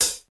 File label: clean foot cymbal A key; hi-hat